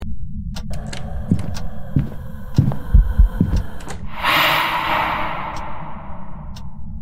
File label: crying woman